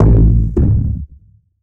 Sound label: Multimedia Internet CD-Rom Flash Animation